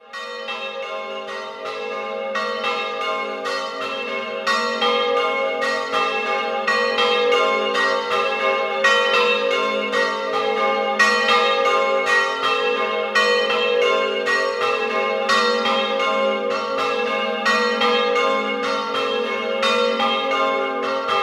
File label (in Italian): Ramuscello, campane